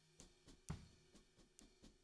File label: SNR Top